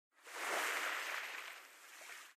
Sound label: swim